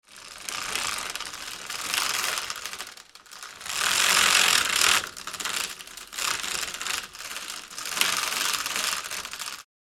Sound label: Fabric venetian blind roll up; blinds